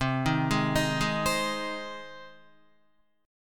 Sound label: C Minor Sharp 5th C down Guitar; arpeggiated; JGuitar